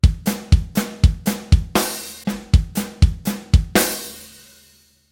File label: Drums